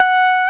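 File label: electric guitar mute F#4; AKAI S-Series CD-ROM Sound Library VOL-8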